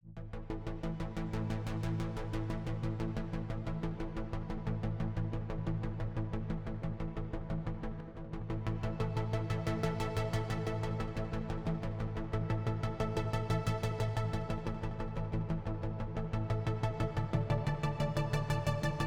Bass II

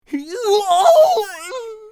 Pig fear; bad piggies; android; mobile; windows

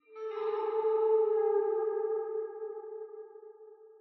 Diving Music Bowed; The Sinking City

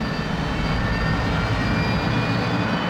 walk; SFX VALKIRY

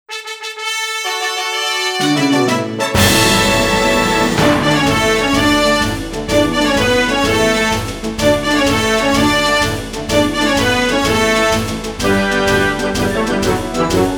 Congratulations!; Rhythm Heaven Fever

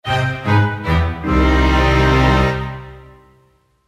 Miss; Luigi's Mansion 3

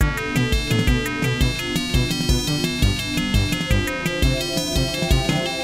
Clockwork Machines - Electro

